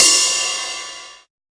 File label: big room ride e key